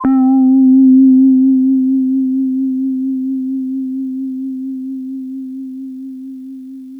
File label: SYNTH